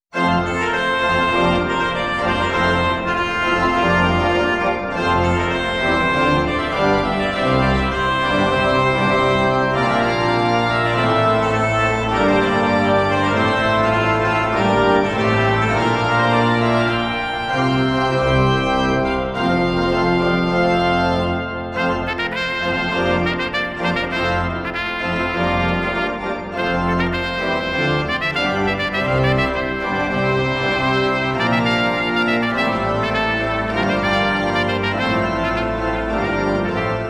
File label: Trumpet Tune; Festive